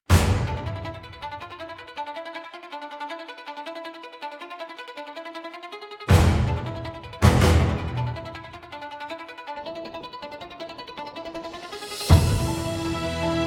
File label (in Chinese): 局内战斗音乐